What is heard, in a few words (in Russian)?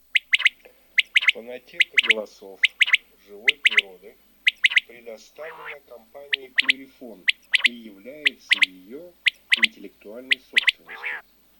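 Перепел Quaglia, chip mini для манка; plurifon; chip mini 20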